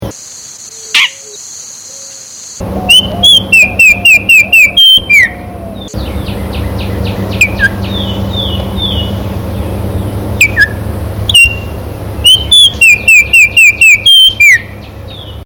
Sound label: altamira oriole song